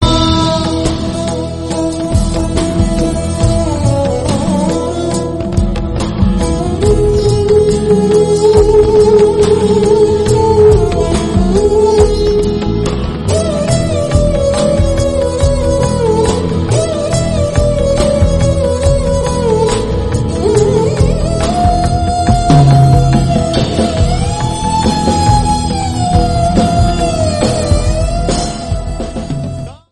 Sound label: sarangi prayer